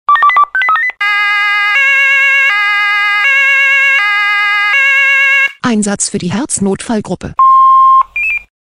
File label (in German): SMS Alarmierungstone Herznotfallgruppe